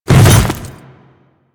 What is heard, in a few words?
Hammer Boss Step Ice, Footsteps; Warframe; Grineer